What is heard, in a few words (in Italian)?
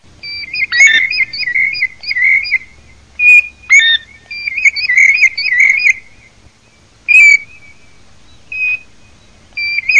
PIVIERE